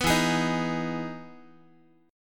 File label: E Major 7th E down Guitar; EM7 strummed